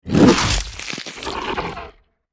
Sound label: Werewolf bite flesh attack